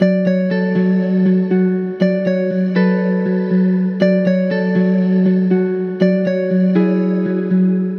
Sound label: Musical Loop 120 F Minor; Clouded; Ghosthack